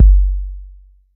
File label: BD 808